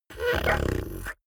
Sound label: beatbox tongue roll hard damped